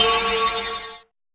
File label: DENOUEMENT, FILM PERC; Drums In Your FACE!